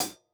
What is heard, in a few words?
hat hit, closed hat